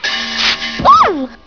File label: Exclamation; BettyBoop